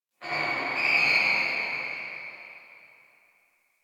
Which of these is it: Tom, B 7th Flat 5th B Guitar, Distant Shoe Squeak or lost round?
Distant Shoe Squeak